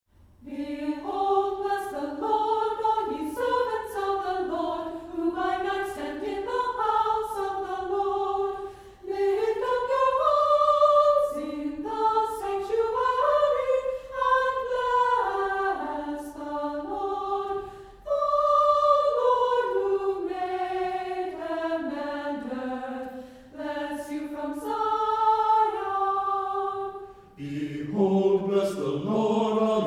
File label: Psalm 134 3 Part Canon